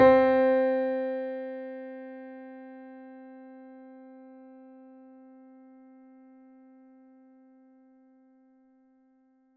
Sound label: mini grand; bananenkeyboard